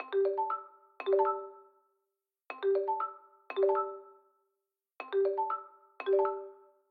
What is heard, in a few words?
Ringtone; LOOPERS